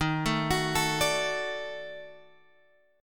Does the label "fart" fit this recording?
No